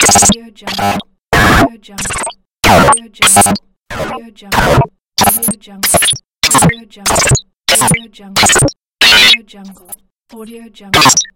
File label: hitech glitches pack